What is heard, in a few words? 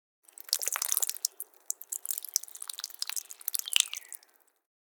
Human peeing urinating outside nature